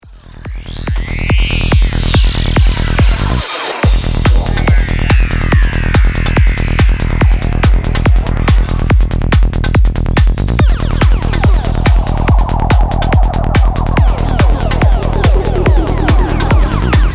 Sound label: Dirty Keyboard